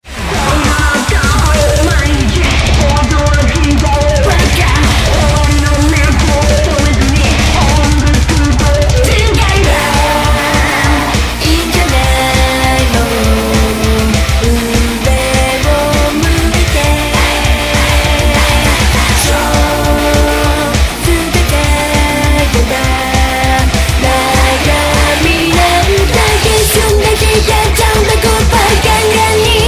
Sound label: Gun Synth Rock